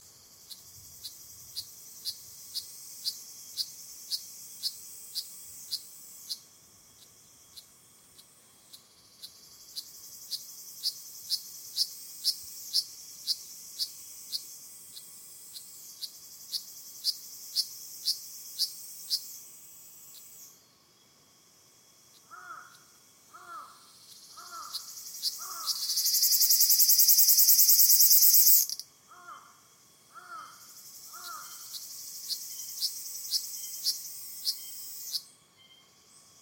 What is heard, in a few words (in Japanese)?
aburazemi